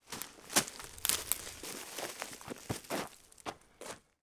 more rustling; jungle-journey

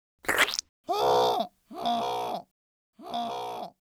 fly by goose splat; emerald-crossing

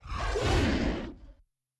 roar little; keepers-kingdom

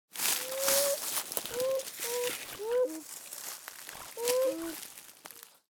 Rustling beaver; operation-arctic

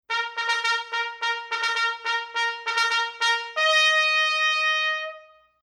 trumpet fanfare; time-lab

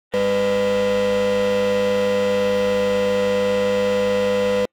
lie detector buzz long; mystery-island

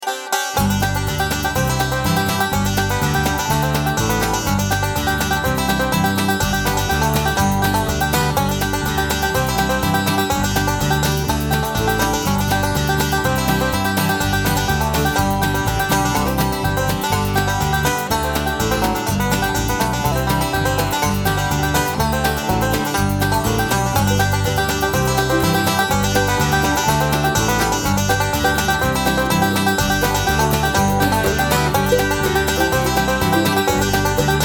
chase scene music; wonder-junction

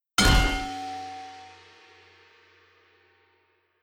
head bang; time-lab

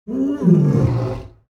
dragon sigh frustrated; keepers-kingdom

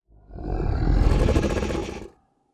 growl; keepers-kingdom

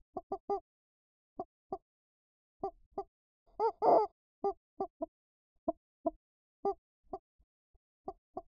muffled chicken clucks; wonder-junction

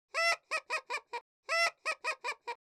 baby goose laugh; emerald-crossing

